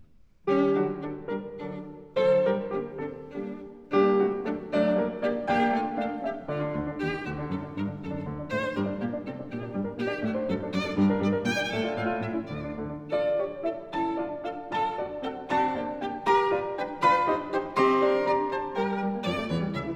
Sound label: Sonata for violin & piano No. 10 in G major, Scherzo. Allegro; Beethoven; ＂The Cockcrow＂